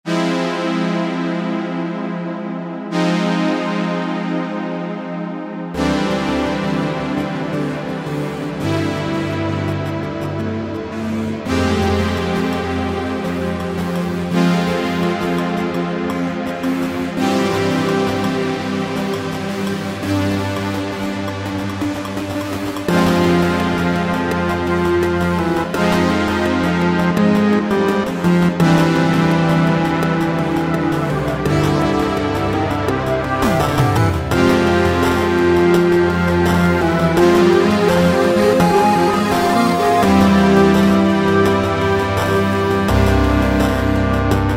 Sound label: Grooving bassy sax; Relaxing electronica